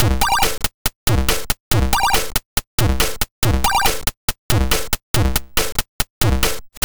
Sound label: Drums, 140 BPM; CHIPSHOP DELUXE; CHIPSHOP LOOPS